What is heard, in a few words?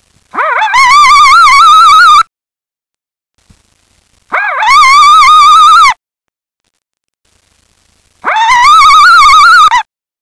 growler; Surface ship & Submarine - IC/D Growler (NOTE - used on sound-powered telephone stations